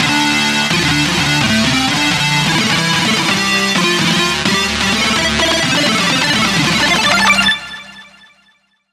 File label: Lead; Digital Paradize; Routed Sound; Vital Expansion - Hyper Gamer Vol.1